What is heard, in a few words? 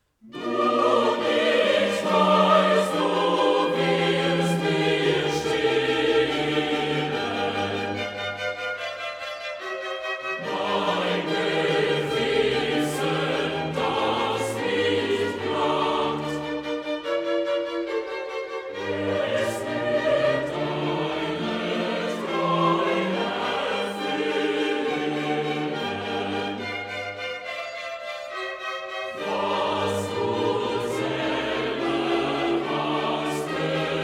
BWV 105 Chorale; Chorale ”Nun ich Weiss, du wirst mir stillen”; bach-operas